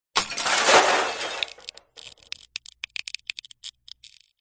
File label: crashes metal glass; Audio Mangler